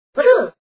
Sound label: woohoo; screamin upstreamin